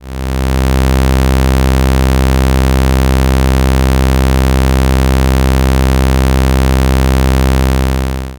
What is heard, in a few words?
Saw; uniCycle